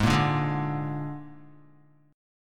G sharp Suspended 2nd Flat 5th G sharp down Guitar, chord; strummed